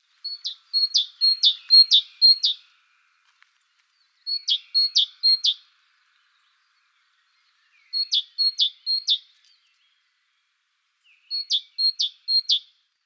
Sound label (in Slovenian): Velika sinica